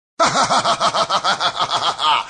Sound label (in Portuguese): risadinha